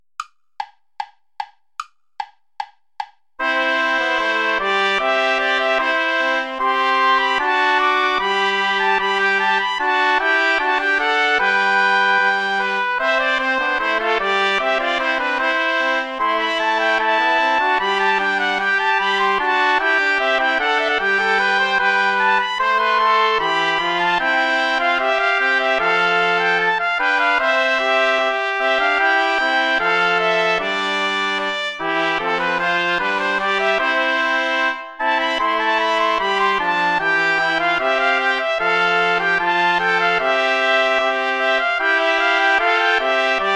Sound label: ballet play along without trumpet; praetorius michael; ballet iii